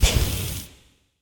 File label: upgrademerge; lightturret